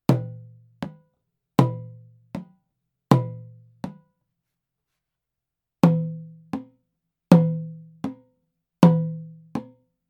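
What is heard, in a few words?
dundun mini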